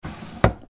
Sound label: Chest Drawer Close